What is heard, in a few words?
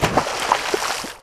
Auto Tires Mud Splash; Automobiles